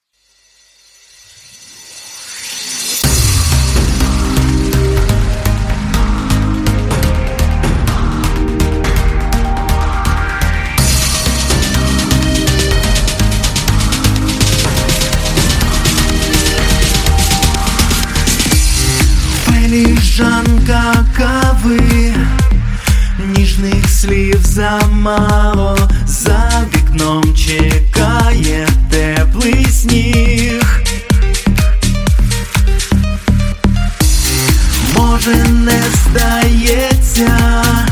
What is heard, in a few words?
UkrainianMusic